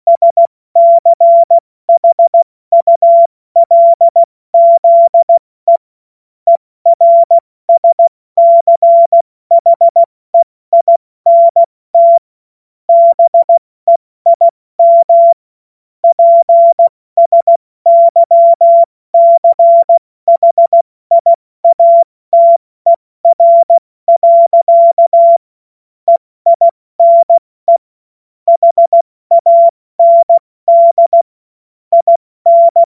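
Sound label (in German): Witz, Morsekurs, cw morsen; Witze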